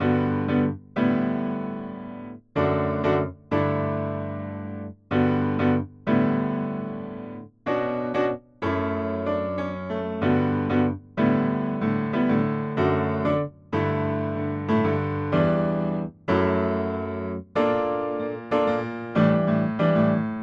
neo piano mini

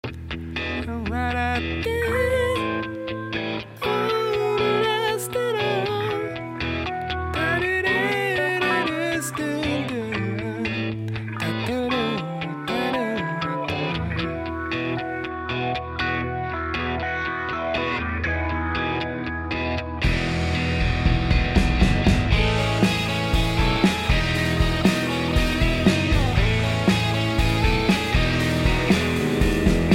a to b lead

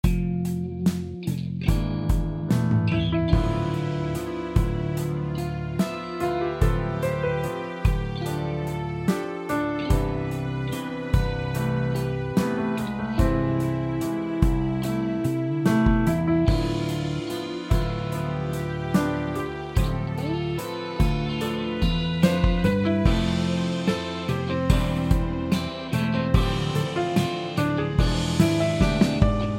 ballade strings

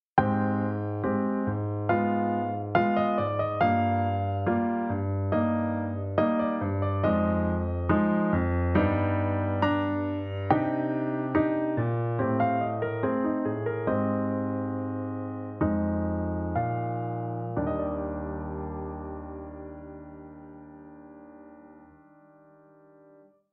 attack 60ms

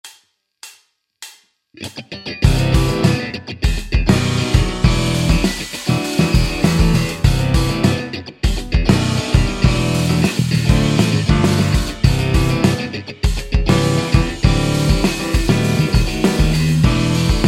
binaural pan

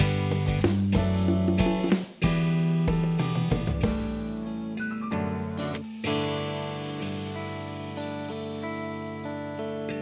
first drum last

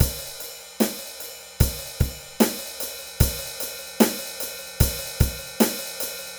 drum

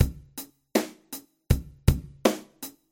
kick snare hihat

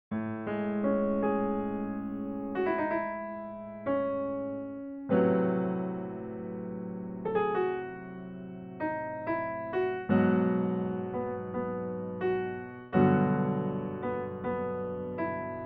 piano

